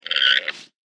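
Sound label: antelope die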